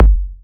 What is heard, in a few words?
Bassdrum